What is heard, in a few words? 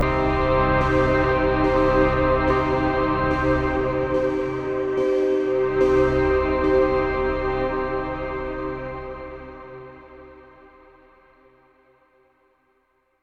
Stinger Exploration Chord; Mirror's Edge Catalyst